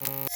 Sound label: deny